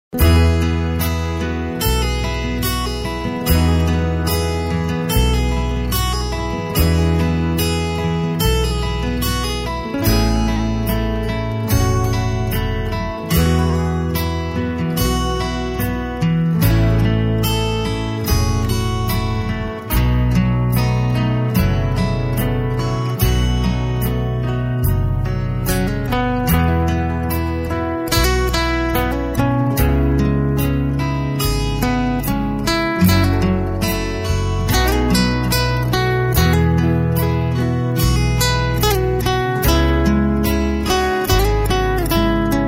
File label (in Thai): เพลง musicbox